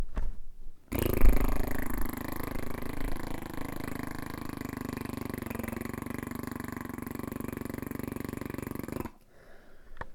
Cartoon Auto Running

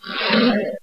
Monster Pain

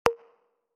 Perc

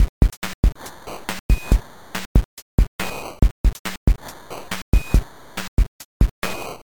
C64 Beat 140

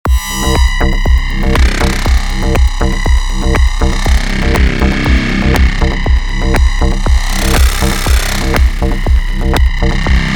Creepy Loopy Intro

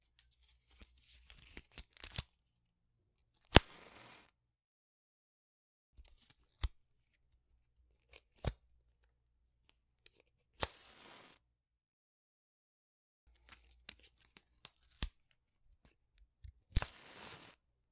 Household Match

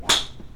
Golf

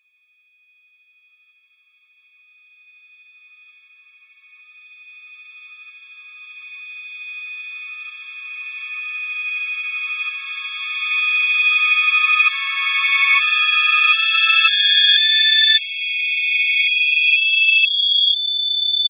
Rising Tension

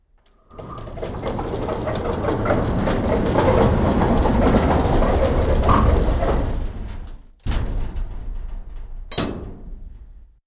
Sliding Door Barn

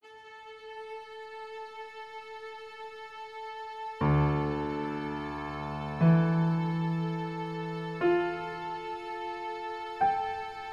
Thoughtful

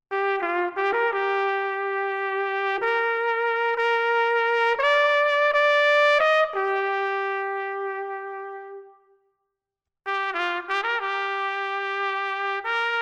Flugelhorn Trumpet

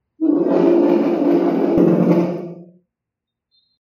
Move A Chair

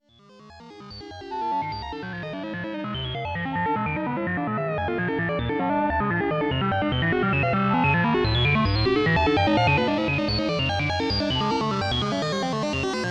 Heartbeat Of A Dying Android

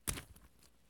Gooey Squish

